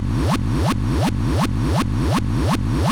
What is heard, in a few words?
164 Techno Reverse Kick; Dance DJ; Drums; Pro Tools Essential Loops; Digidesign